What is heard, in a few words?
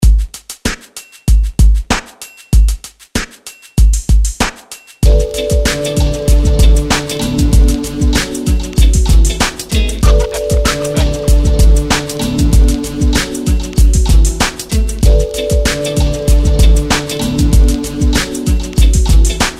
scratch in the city vintagewarmer